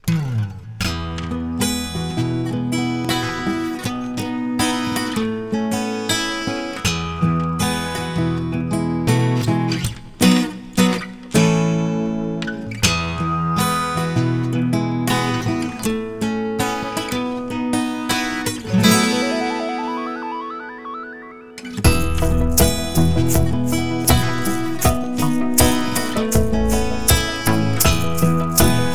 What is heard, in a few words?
Fields of Acoustic Dreams